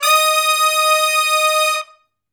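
Hi Medium Long Note (Eb) unison, Horncraft for R&B; Sections; Crimson Blue and Fabulous Horncraft 4 RnB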